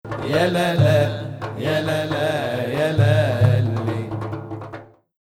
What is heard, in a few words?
Hijaz